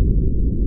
Collision

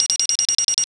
sensor; firestorm; Gameleap; mw4

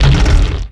Crunch

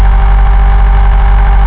Torso Twist Int

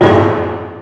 Maint Pulse

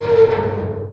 Collision

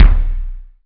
Auto Canon Action

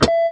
Config Switch

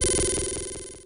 Radar Button

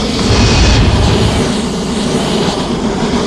Flame Machine

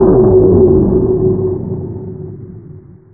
Laser A Sustain

